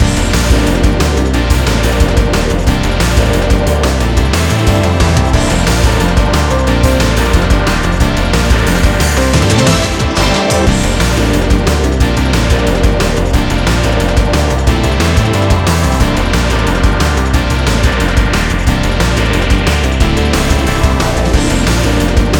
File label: bgm boss